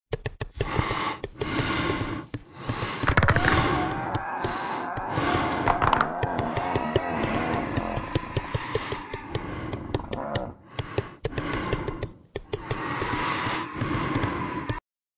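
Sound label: IDLE LOOP; amy tapes; SONIC TAPES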